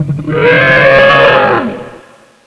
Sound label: evolution, zombie giant; dione